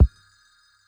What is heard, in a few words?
Good Kick, Drums; BB 2HTC Samples Vol 4